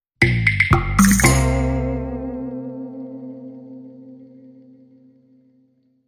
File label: JINGLE GUITAR